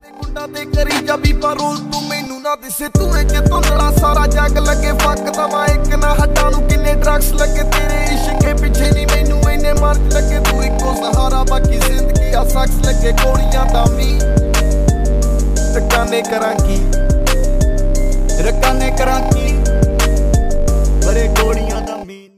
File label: Nypd ringtone